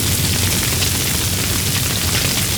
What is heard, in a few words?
Fire Loop; Destruction